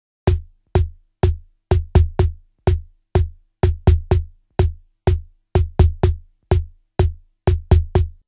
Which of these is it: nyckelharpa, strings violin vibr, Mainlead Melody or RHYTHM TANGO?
RHYTHM TANGO